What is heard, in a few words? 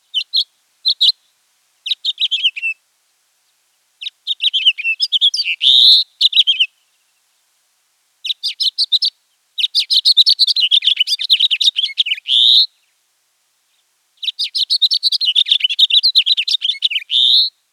House Finch Song